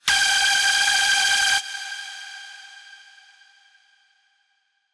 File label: lead